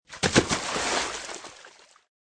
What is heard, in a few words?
zombie entering water